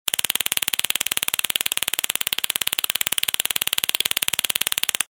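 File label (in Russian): звук электрошокера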